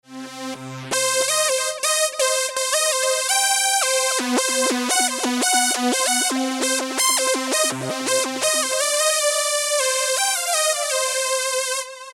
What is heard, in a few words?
Lead Screamin Warp Ld, Alesis Micron; Factory Programs